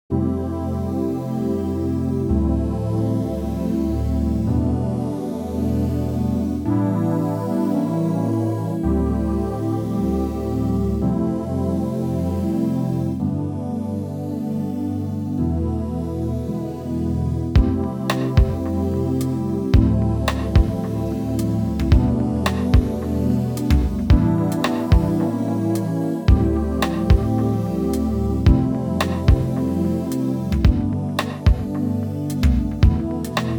Ambi Pad

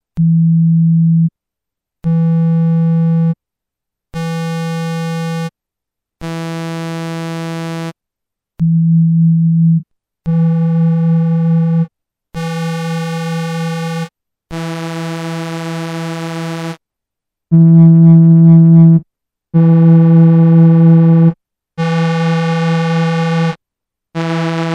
Synth Solo; Teenage Engineering TX 6

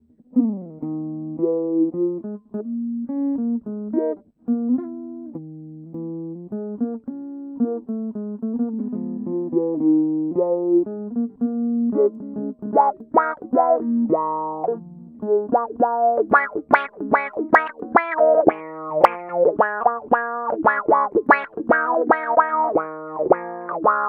Funky Envelope

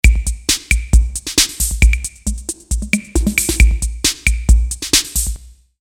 Ace Tone Percussion Plate

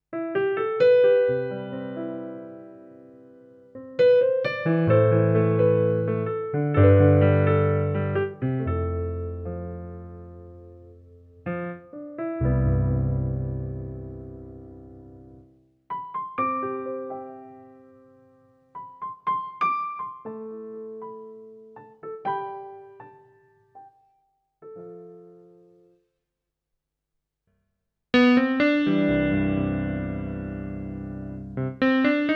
Piano

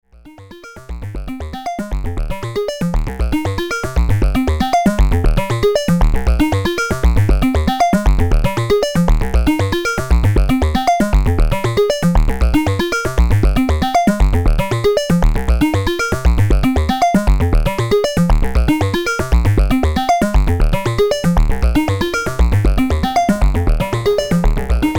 Phase Shifter Waveshaping

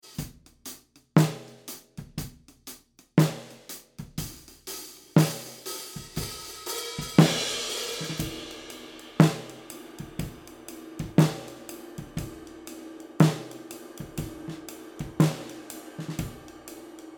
Austrian Audio CC8 Drums OH XY

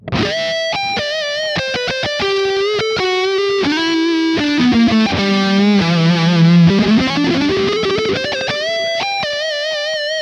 Harley Benton DC DLX Highgain Lead

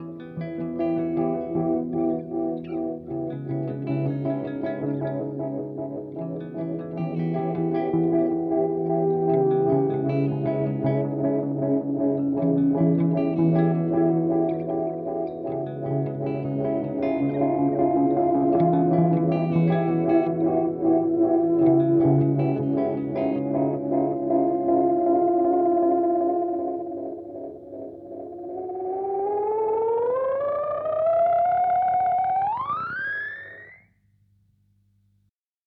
Analog Delay

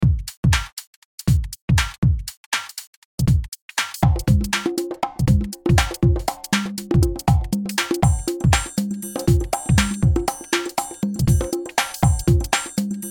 Groove Lock; RMX

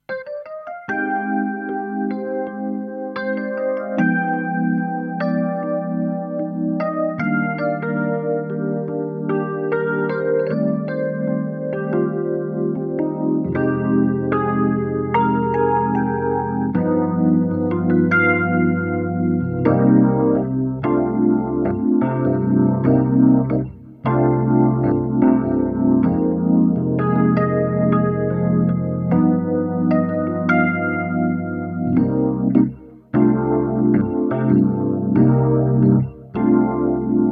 E Piano; Neo Ventilator II

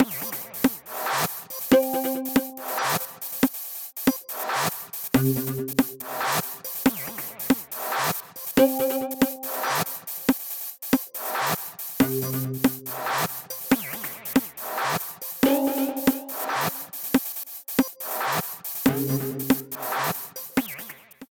Rapid Loop Constructed; Parawave

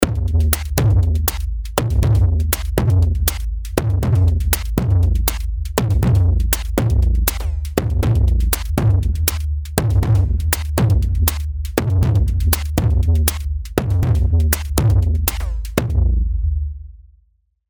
DrumComputer DnB Kit; Monolog

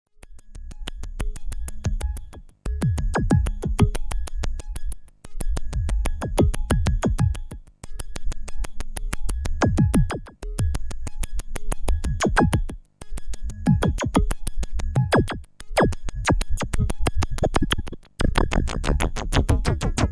synthex resonance

